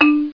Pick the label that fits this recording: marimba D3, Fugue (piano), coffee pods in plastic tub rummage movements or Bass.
marimba D3